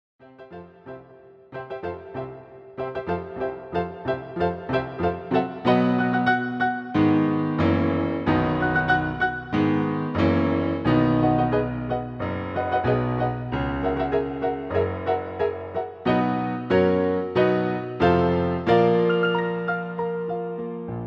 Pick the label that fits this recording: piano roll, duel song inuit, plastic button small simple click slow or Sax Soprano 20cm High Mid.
piano roll